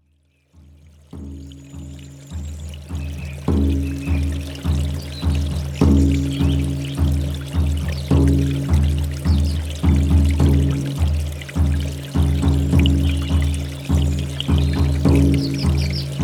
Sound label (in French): musique chamanique; chamanisme